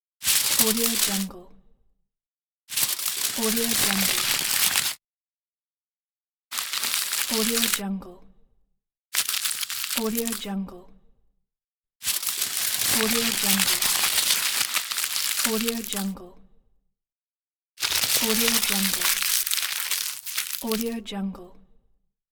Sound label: foil